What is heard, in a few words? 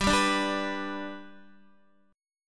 G Major, Guitar; strummed; x,10,7,7,7,7; 4F,4A,5C,5G,5C,6E